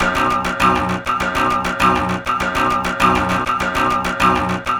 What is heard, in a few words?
Processed Guitar, Loops; Guitars